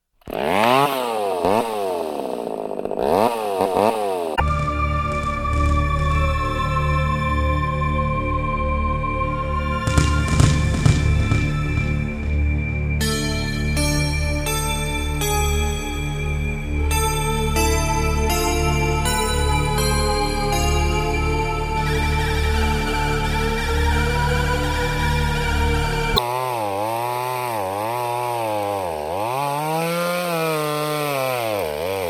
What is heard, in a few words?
Chainsaw; 1001 Sound Effects; Halloween Scary Music and Sound Effects; Scary Sounds Effects